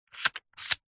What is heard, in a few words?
leisure game plastic panel squares slide solving puzzle; rubiks race